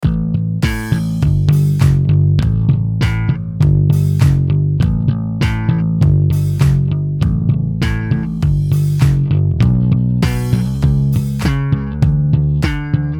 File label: Jag Slap